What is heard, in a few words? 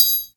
steel; lix